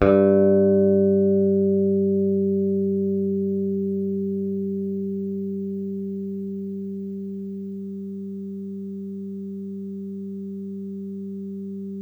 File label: PICK BASS, Electric Basses